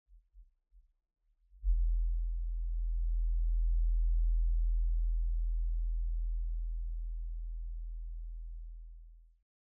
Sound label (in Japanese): bass E 41hz のみ; イコライザー（eq）